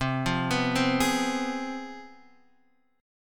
C Major 7th Suspended 4th Sharp 5th, C, down, Guitar, Open D